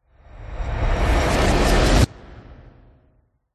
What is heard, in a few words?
cinematic riser dramatic hard hitting tense; sound design; zapsplat